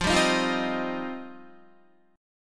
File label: F 7th Suspended 2nd, Guitar; F7sus2; strummed